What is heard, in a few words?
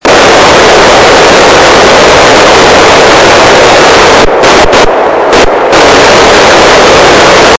Safe mode pulse beacon; SSETI Express; This is a recording of the "safe mode" pulse beacon; This came on when the battery volts fell too low for the main system to operate